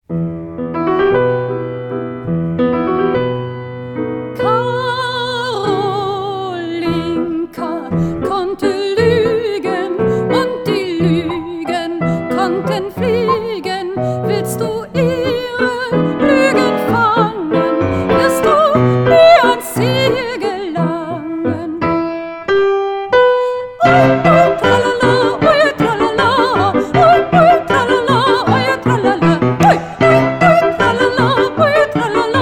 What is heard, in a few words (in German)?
mit Gesang; Karolinka